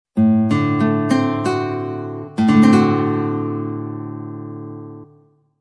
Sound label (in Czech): Akord D7